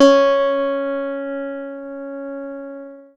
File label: C#, Synclav FM PLUCKED; Big Fish Audio